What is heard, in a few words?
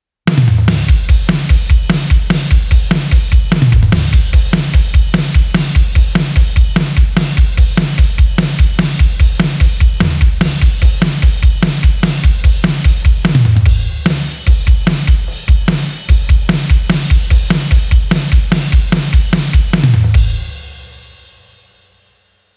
POP ROCK DRUMS ONLY